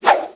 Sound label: Warrior Blade Swing; Playable Tutorial Level; TR2